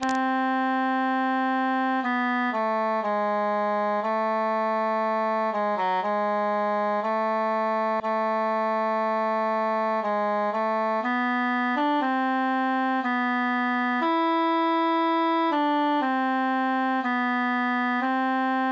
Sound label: tenor solo; weep o mine eyes